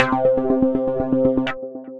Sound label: Resonance Anvil, Synth Lead